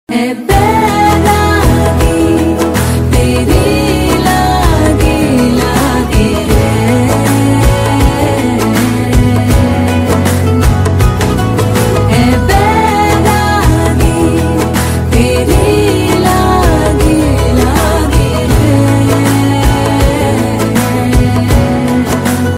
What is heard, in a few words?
Female Ringtone; Bollywood; Bareilly Ki Barfi